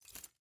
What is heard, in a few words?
sfx ui status window open